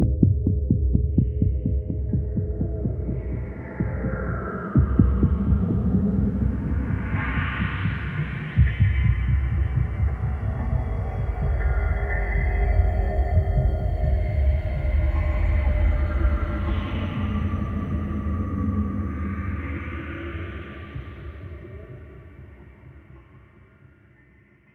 Sneak

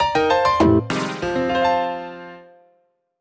Jingle Light Board Opening Light; Clubhouse Games: 51 Worldwide Classics

